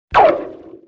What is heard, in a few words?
Zoink, Vintage Cartoon